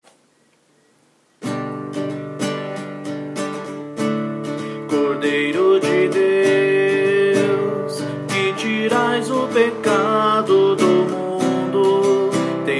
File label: vocal